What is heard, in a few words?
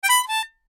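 Samsung Galaxy S22 ringtone notification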